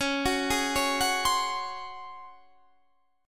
Db Major 7th Suspended 4th Db down Guitar Standard; arpeggiated; JGuitar